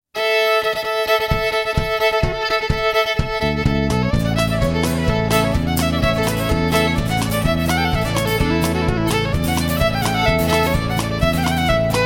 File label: red river jig